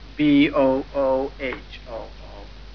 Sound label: boohoo